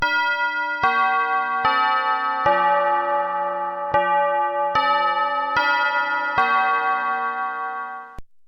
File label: Bell, Deurbellen